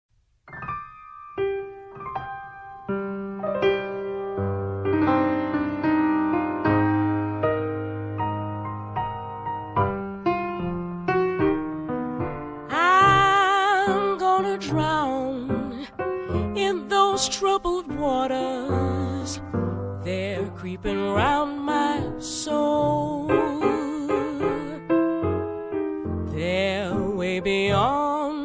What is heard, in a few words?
Piano Jazz; Manhattan Beach Studios NY NY